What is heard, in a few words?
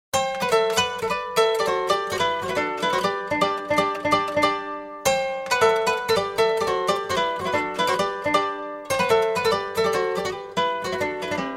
Senegal, Jali with Kora